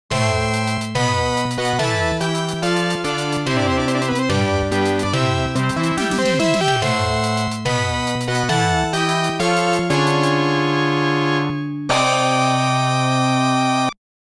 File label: Tragic Wedding March; AKUMAJO DRACULA